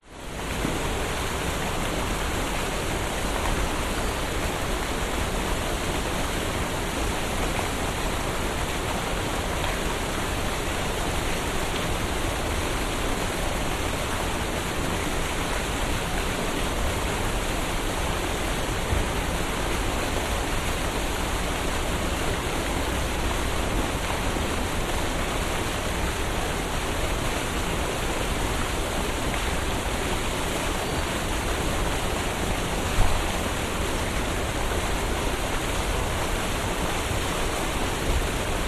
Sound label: public places city street night quiet water fountain brisbane